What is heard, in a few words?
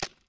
vm empty from side clip, guns; vm-68